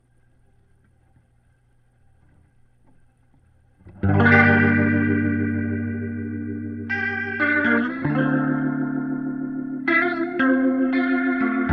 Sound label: Leslie gtr